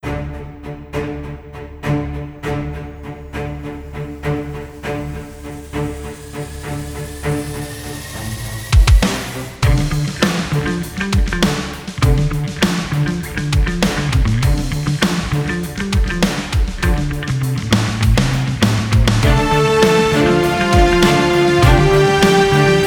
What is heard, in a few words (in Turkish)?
haber; By Burak Sound Bank; haberler news